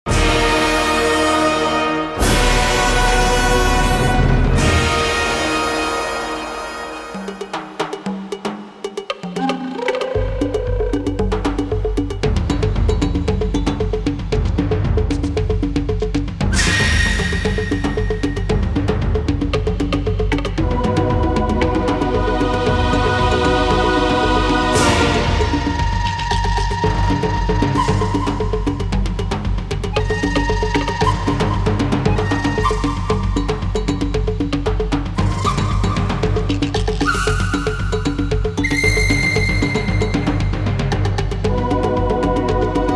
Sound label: Dinosaur Drums Huge Feet; Dinosaur Island